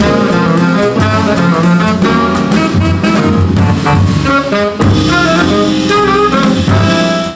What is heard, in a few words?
Live Jazz Odd Melody